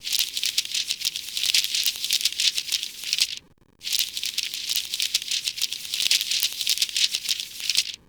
notebook; lbelle

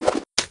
toss; shiv

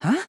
huh; hajime; drv3

